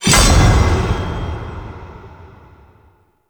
Sword Block Break; Warframe; Duviri; Dax; Weapons

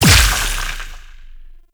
Impact Large; Duviri; Incarnon Adapters; Weapons

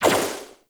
Guardian Shell Impact; Warframe; Focus; Vazarin

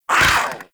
Combat Vocal Attack Short; Warframe; Catbrow

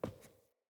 Boot Walk Wood, Footsteps; Characters; Enemy; Boots; Sinecure; Melee Grunt

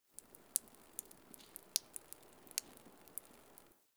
Exterior Water Drip; Warframe; Nineteen Ninety Nine

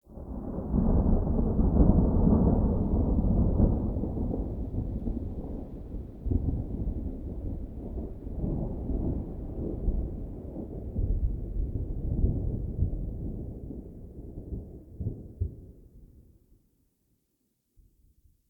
Night Rolling Thunder; Night One Offs; Ambience

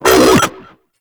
Thermal Shock Cast; Warframe; Runner

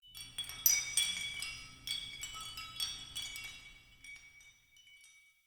Hut Interior Chimes; Ambience; Midrath Island; Grandfather

